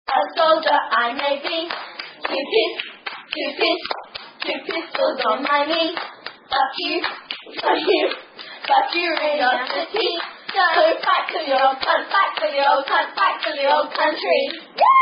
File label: Clapping With Song; A Soldier I Will Be (Asshole